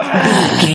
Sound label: voice female effort tired